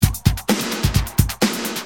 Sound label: Tight, Drums Perc; Industrial